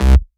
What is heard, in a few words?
Basses Root C